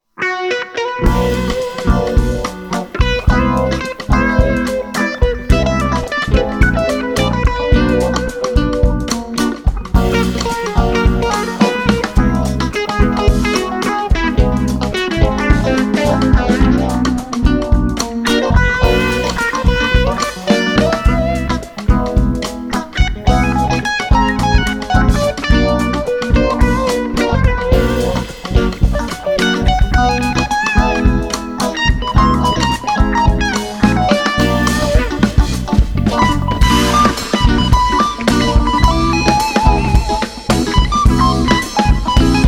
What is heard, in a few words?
neapolitan major